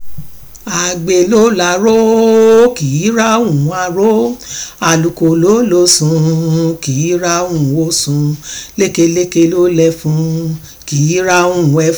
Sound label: Traditional song to encourage diligence